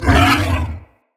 Corrupted Pain, Animals; Bromius

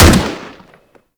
Rifle Shot; Warframe; Tenno; Tenno Drake Rifle

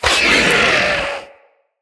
Spider Vocal Pain; Vocals; Root Spider Mother

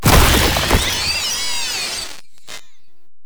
Door Ink Blob Explosion; Shadowgrapher

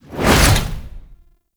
Alchemy Grenade Throw; Entrati

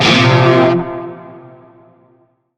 Shawzin Pent Min Chord; Warframe; Narmer Shawzin; Chords

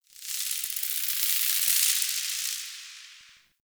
Insect Wing Flutter; Ambience; Warframe; Nokko Colony

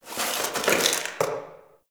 Box Of Trash Rustle

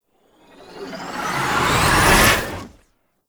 Apparition Riser; Warframe; Warframes; Dagath

